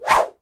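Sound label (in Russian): разрубающий воздух удар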